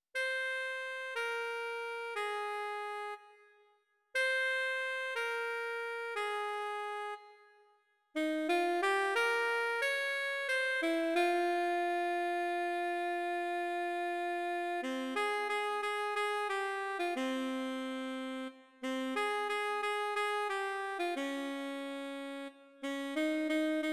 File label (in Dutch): accordeon